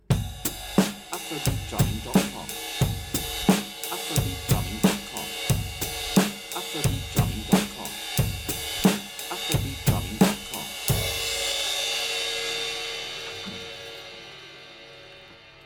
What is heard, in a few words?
hi-hat foot, afrobeat drumming